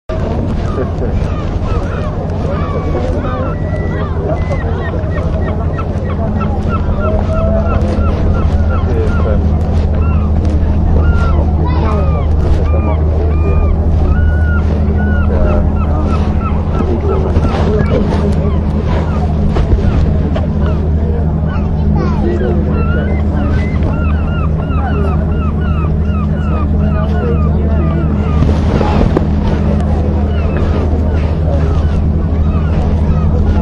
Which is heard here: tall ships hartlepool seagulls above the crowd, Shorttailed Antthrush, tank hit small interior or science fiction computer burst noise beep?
tall ships hartlepool seagulls above the crowd